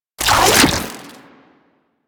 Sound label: Rifle Charged Grapple; Warframe; Infested; Quanta